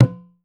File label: IYA LW D, BATA; Voice Crystal - Steve Reid Definitive Percussion